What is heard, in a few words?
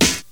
loud hip hop acoustic snare sample b key